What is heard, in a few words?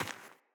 Sandals Run Rock, Footsteps; Player